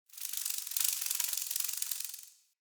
Cave Sprout Insect Close

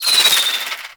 Bow Arrow Rain Whizz By; Ultimate Abilities; Madurai; Warframe; Focus

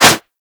Whoosh, Weapons; Warframe; Furax

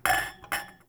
Glasses Clink; Ambience; Warframe; TheNewWar; Zariman

